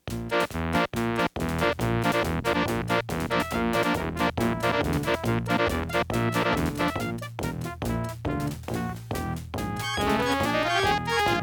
ESS ES688; Day of the Tentacle Opening

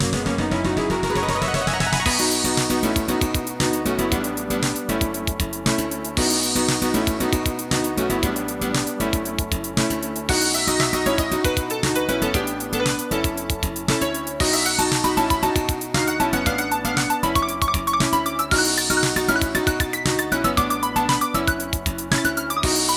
AWE64 Legacy; Legend of Kyrandia 2 Dragonflight

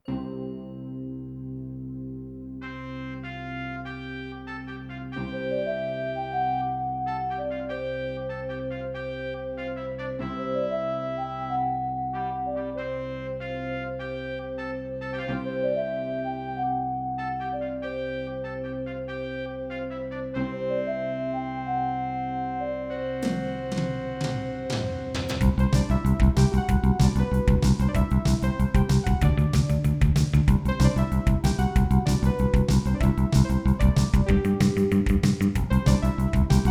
AW744L; Space Quest 3 Introduction

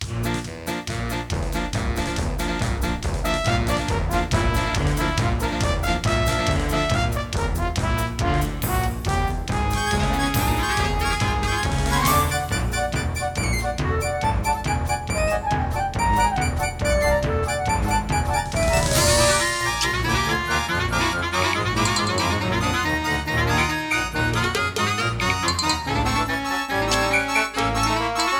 AWE64 Legacy; Day of the Tentacle Opening

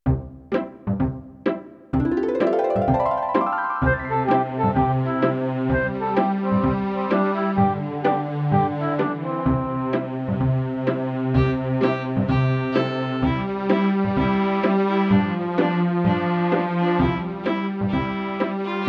Sound Saurus; Settlers Intro

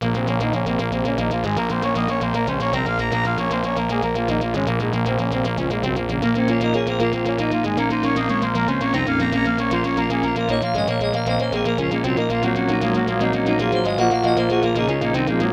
ESS ES688

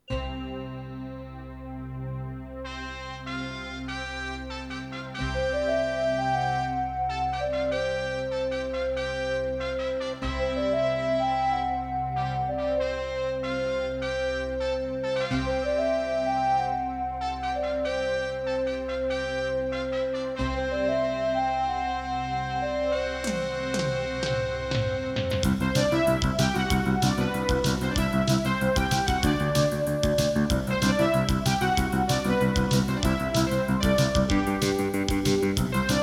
AWE32; Space Quest 3 Introduction

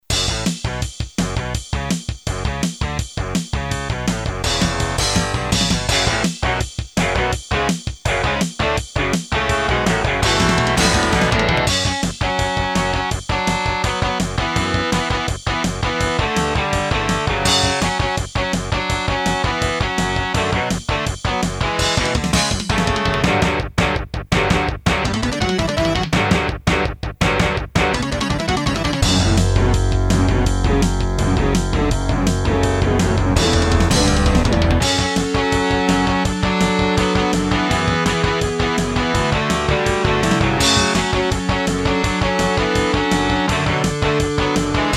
Casio GZ-50M; Duke Nukem 3D Intro

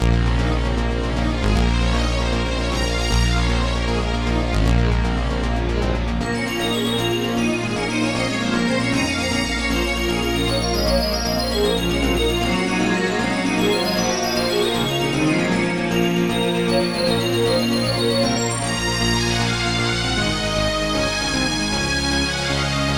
AWE64 Legacy

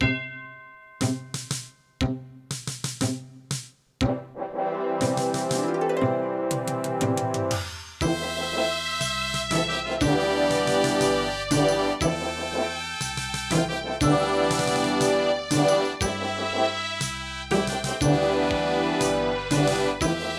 AWE64 Legacy; Runescape 08

